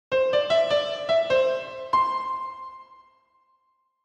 freeverb, Piano, reverb

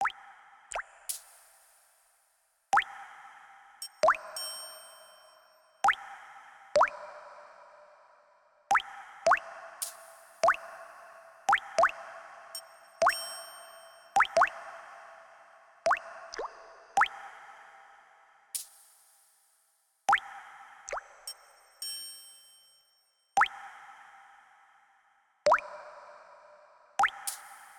reverb water triangle test

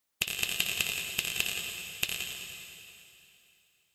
fdn, Snap, reverb

